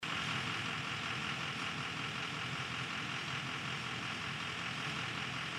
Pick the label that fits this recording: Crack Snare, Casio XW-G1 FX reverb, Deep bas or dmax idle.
dmax idle